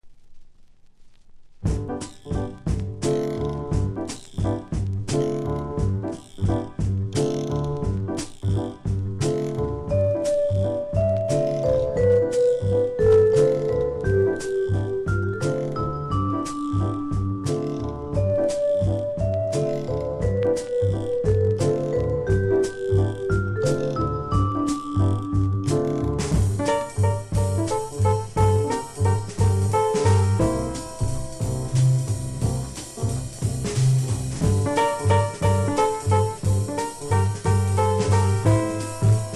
jazz

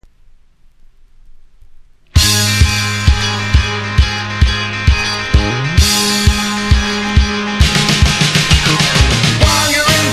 laugh; sheriff jack

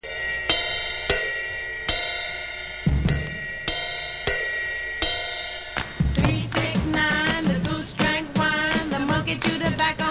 clapping song

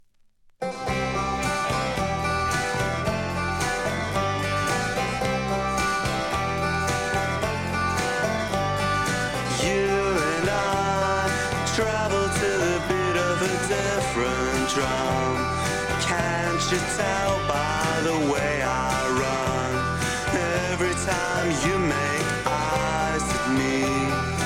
drum

